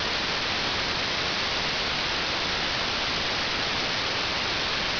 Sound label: noise